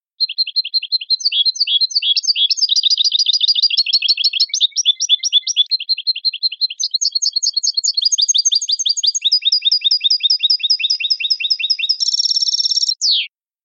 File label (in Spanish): discordantes